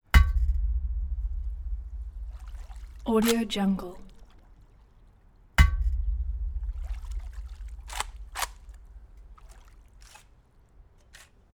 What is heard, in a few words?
ak47 underwater machine gun single shot